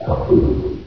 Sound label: ichy idle; Source Engine; bshift